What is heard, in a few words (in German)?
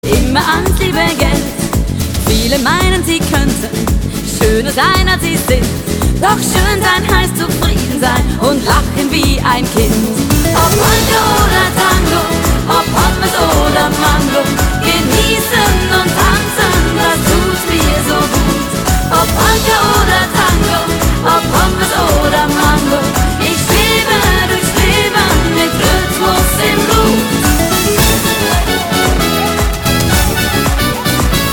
Polka